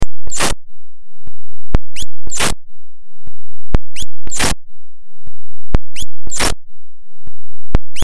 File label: fm7 patches